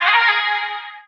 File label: vocal feelings Ab; SD AURORA Sample Pack; Slate